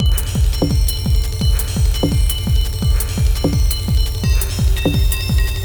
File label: Weird Delay